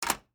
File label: Door Lock Hotel